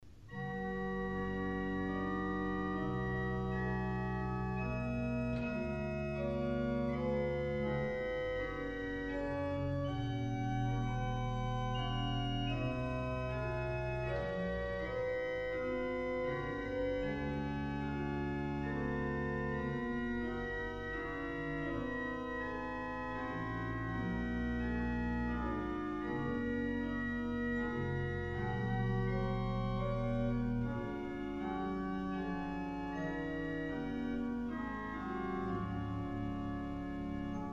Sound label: pipeorgans; Mertel